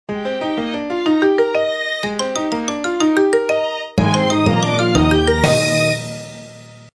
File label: tone; Samsung; SGH-i937 Focus S; ATT